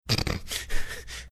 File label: snortling